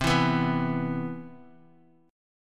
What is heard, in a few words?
C Major 7th, chord; CM7 strummed; JGuitar